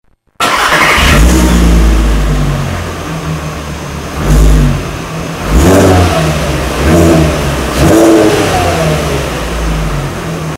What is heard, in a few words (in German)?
Auspuff A3 3 2quattro; Der Klang des Serien-Auspuff; Audi A3 3.2 quattro; Sound- und Geräuschdatenbank